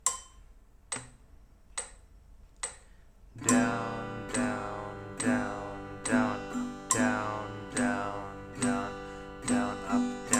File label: Strumming Pattern, guitar